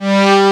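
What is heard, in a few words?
G2, SYNTHESIZER, analog STRINGS; AKAI S6000 CD-ROM - Volume 1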